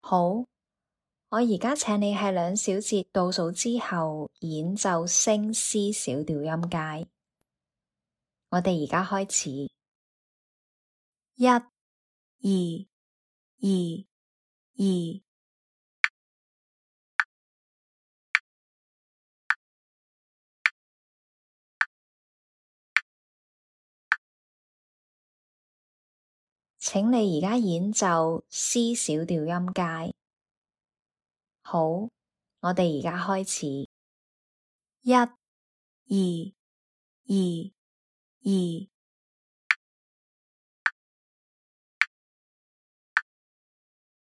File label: C sharp min scale C min scale